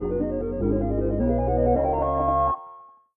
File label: win; Balatro